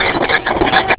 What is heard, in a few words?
printer; MiniSpeak Special FX